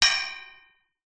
impacts metal gate pole hit with small stone

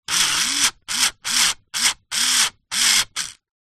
science fiction robot motor server movements

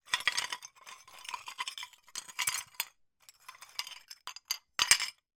foley broken dish pieces movement; zapsplat

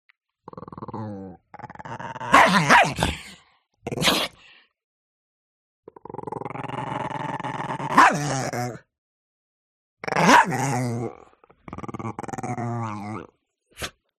DOG Chihuahua Growl Bark; ANIMAL; smartsound

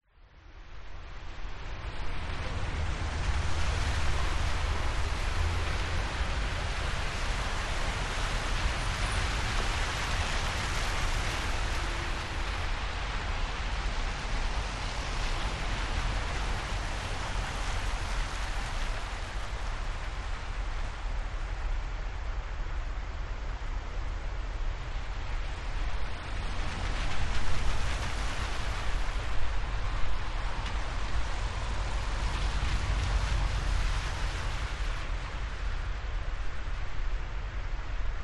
Winter Slush Traffic; west wolf

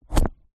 food carrot slice knife chopping board noise; zapsplat

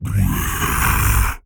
Screams Shouts Monsters Monster Struggle Grunt; Screams Shouts 2; soundbits

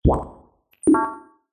science fiction robot glitch beep reverb

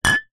glass bottle beer down on concrete ground; foley

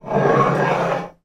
food ketchup glass bottle slide across table; zapsplat

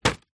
foley paper airplane land hit surface

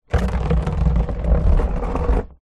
foley plastic tub large roll on concrete; zapsplat

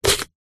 apple chop knife wooden chopping board

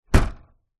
car boot trunk door close external toyota corolla

